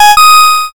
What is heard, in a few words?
correct